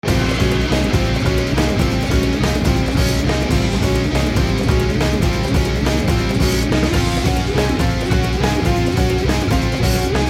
story serious

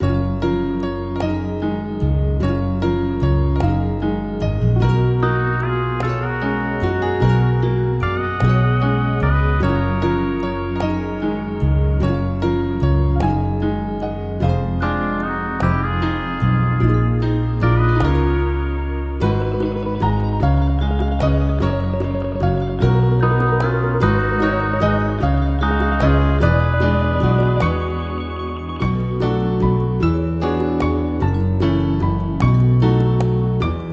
story talk on beach

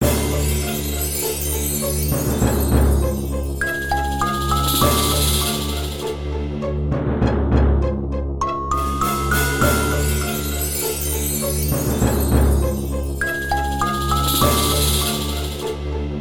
story threatening heavy

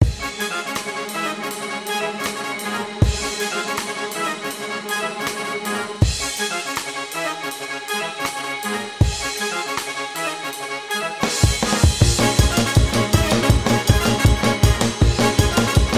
summer story fight sea

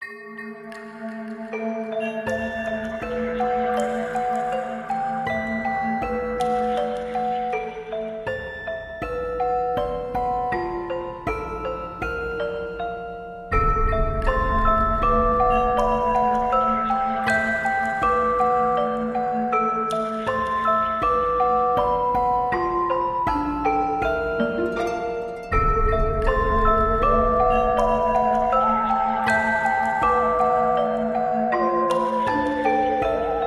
halloween story lonely

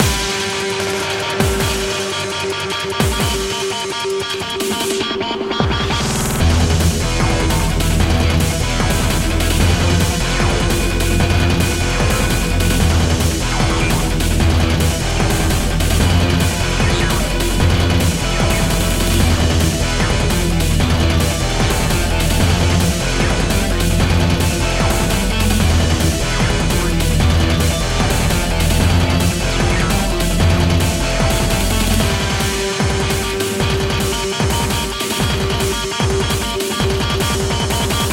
cyberpunk lastboss battle

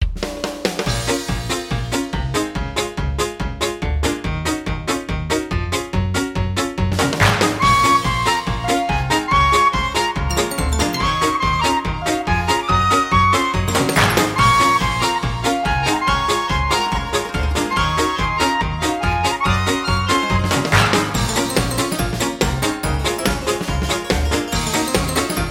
halloween story superiority

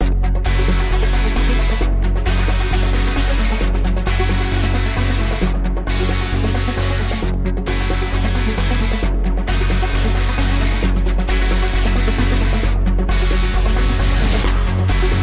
story battle soft; beasts

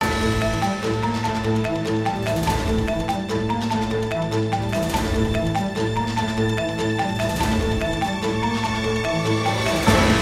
summer story climax

